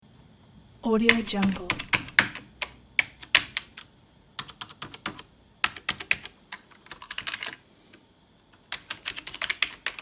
typewriter

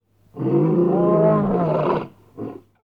lions beastly

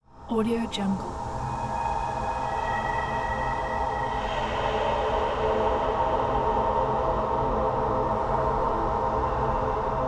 horror ambience

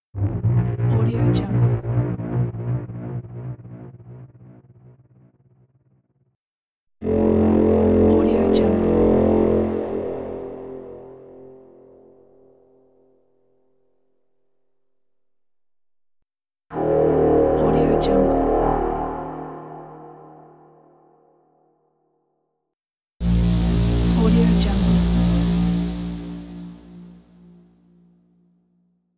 impending braams

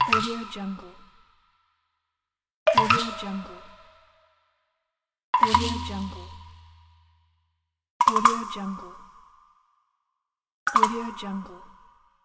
game wooden menu click

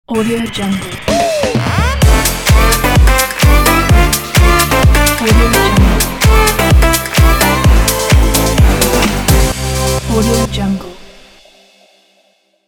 big room logo

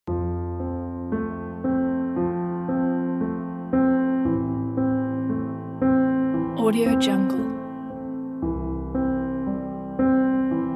piano beautiful; cleanmindstudio

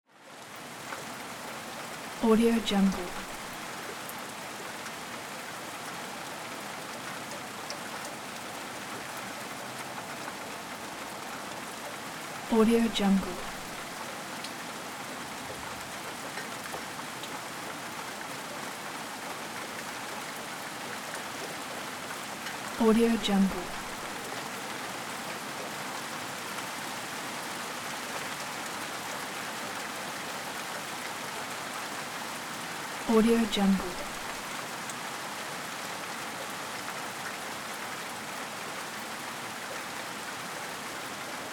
pouring rain

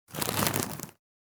bin

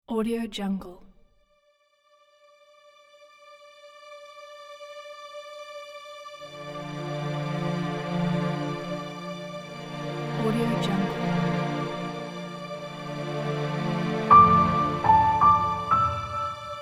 hopeful sadness pack

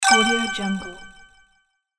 puzzle sound

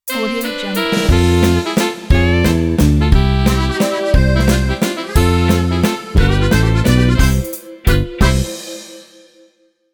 italian waltz logo

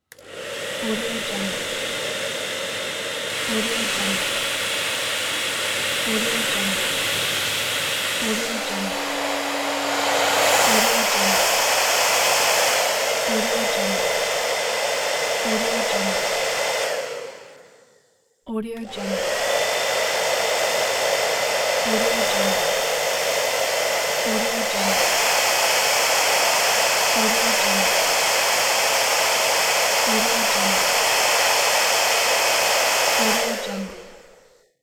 hair dryer professional

